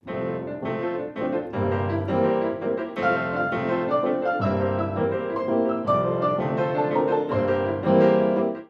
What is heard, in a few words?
two Pianos